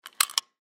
Go Kart Seat Belt, Go Kart Seat Belt Buckle Electric; Unbuckle; Go-Carts; Vehicles